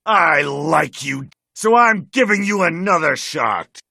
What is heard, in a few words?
jeers